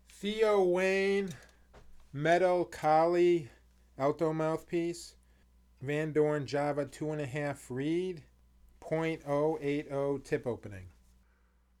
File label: Gold Alto; TW; Kali